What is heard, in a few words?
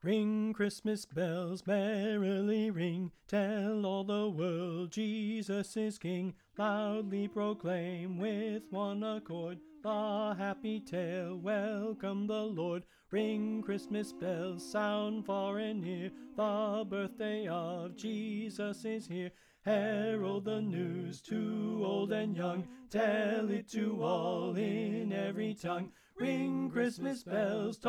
Slower Soprano Left